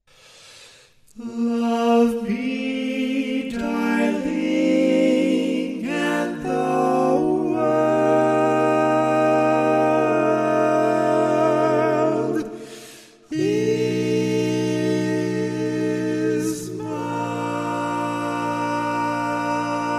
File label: Lead, barbershop tags; Old standard version